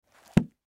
Book Large Cover; Book Cover Close; Books; Offices; Foley; Blastwave

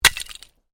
Ice smash light, Ice: small piece of ice smashing; Snow; Weather; Stuart Duffield

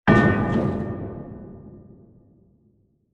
Anvil Impact Mixed With Other Elements; Blasts; Impacts; Crafted Signals

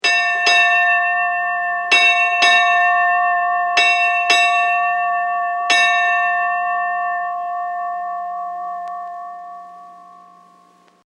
Ship Bell 4 Rings; Ship Bell 7 Rings; Boats; Vehicles

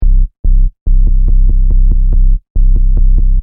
Techno Trance Sub Bass Loop In C 142 Bpm; Bass Loops

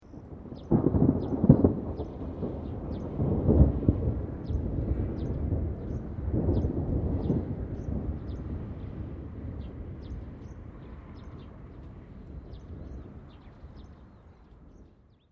THUNDER DISTANT LOW BIRDS; Elemental weather effects

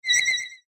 High Laser Wire Zap, laser gun; Andrew Fleming

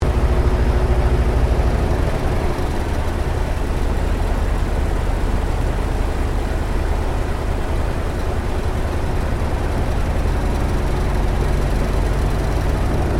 AIRCRAFT T-28D TROJAN PREFLIGHT INTERIOR; Airplanes

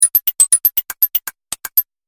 Robot Clinking Sounds; Robot Sound Effects; Science Fiction Sound Effect

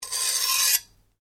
Blade Scrape Slow, Sword; Foley sounds for melee weapons; Swords; Combat

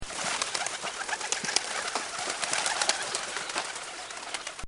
Pigeons Taking Flight

